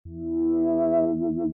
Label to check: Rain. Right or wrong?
Wrong